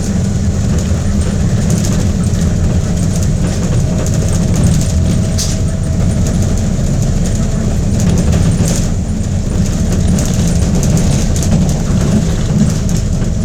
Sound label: Large Generator; Generators